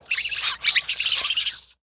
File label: Rodent Nest